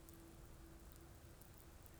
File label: pulses, BALAENOPTERA; MONACO 2022-07